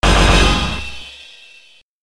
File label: Power-up; baseball stars 2; arcade